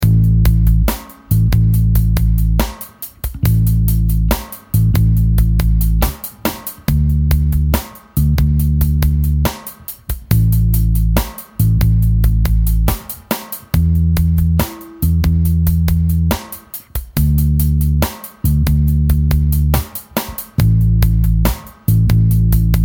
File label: 12 Bar Blues; the blues scale; improvization basics